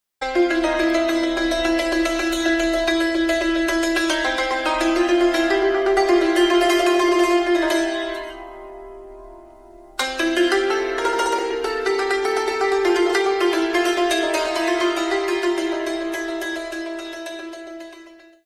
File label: Syrtos; Grece